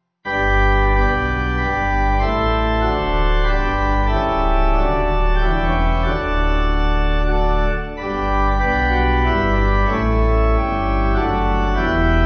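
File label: Pipe, Pipe Organ backing; Weisse 9.8.9.8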